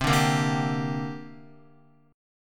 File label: C 7th Suspended 2nd, Guitar; C7sus2 strummed; JGuitar